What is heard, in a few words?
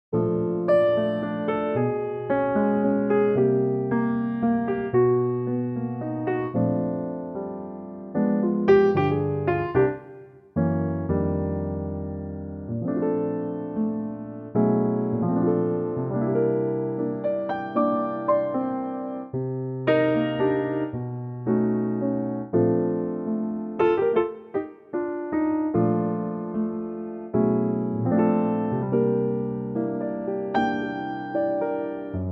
Bb Piano